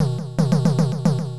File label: Flying Drum; pc engine super cd-rom2; Tengai Makyou II Manjimaru